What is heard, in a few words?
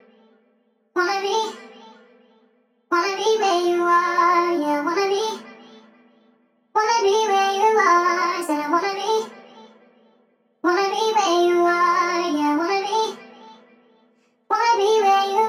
vocal resample dry; wavefield